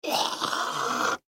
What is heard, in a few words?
Human Spit; Hock Long Element Male 20 Yrs; Human Hock Long Element Male 20 Year Old; Spitting; Blastwave